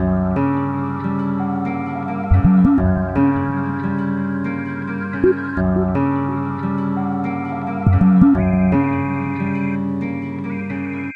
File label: Melody, Hip-Hop